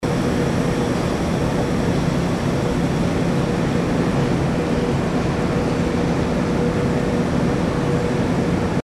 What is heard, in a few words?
station steady atmo